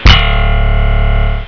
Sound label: GUITAR